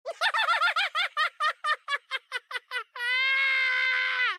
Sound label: laughing; junkrat